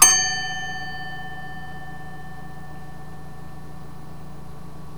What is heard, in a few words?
atc bell